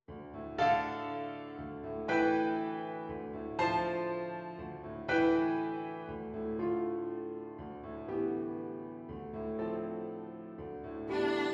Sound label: Violin